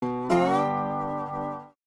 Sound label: Slide Guitar, notifications; Samsung; Galaxy A56; Fun